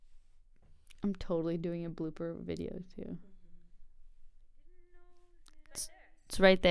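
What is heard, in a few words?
vocals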